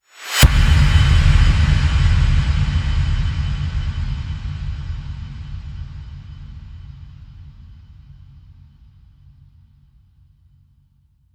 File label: Impact